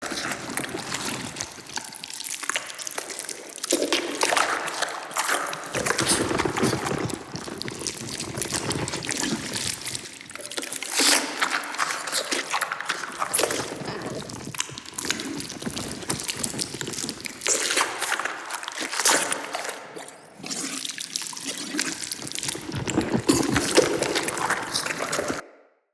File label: Stomach Loop; Ambience; Bromius Inside